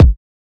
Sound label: smooth bottom end steel kick drum A key